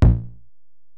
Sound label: EPerc, Alesis DM-PRO; 300 Drum Machines